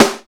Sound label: TAP SNARE; East West - Phat & Phunky